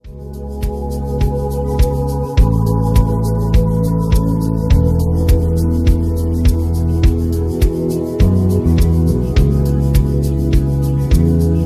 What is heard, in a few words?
rhythm